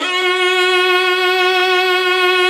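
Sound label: violin vibrato, Orchestral; Sound & Vision; Gigapack I CD 2; Violins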